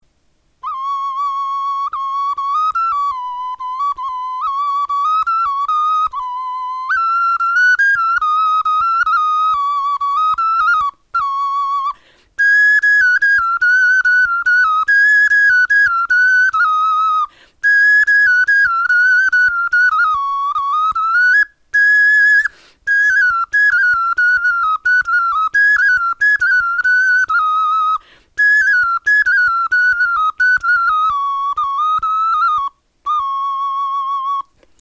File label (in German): melodie, knochenfloeten